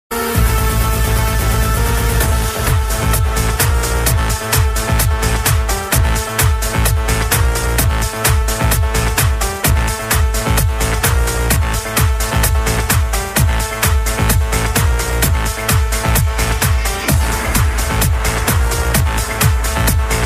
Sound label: Sweet Digital Music